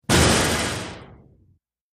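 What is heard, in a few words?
Heavy Metal Tank Smash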